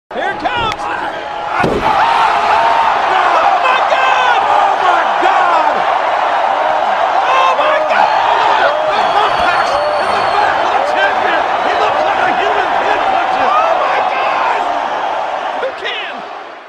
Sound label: wrestling